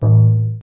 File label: Stone Axe; pokerogue; battle anims